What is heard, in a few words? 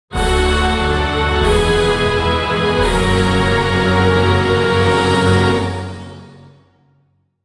Level Complete, Music; Dungeon Defender; DunDef